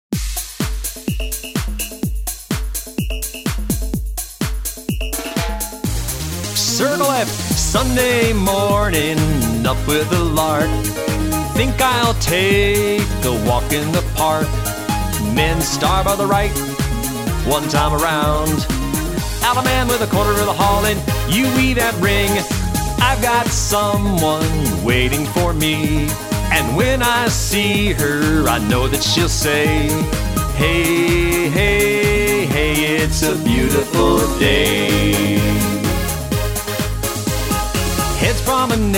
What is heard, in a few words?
vocal